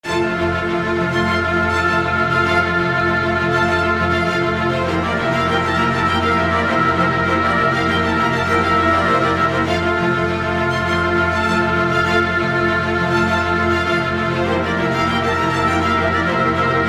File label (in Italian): Allegro; Non presente come numero indipendente nella riduzione per pianoforte